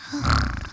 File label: snoring; pauline; SM64 CO-OP